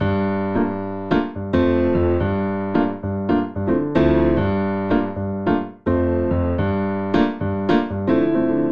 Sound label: Vamp Latin Jazz Comping G Minor 110 bpm, Grand Piano; MIDI Clips; Ableton; Factory Packs